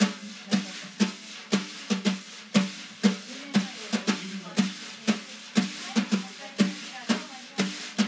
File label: jazz drum